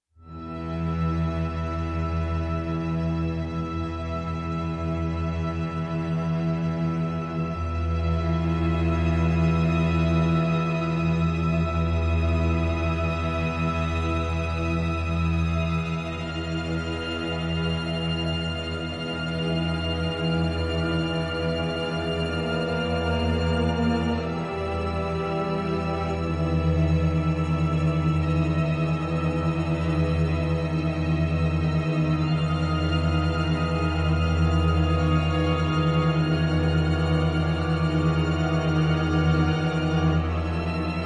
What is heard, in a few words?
Tension Cinematic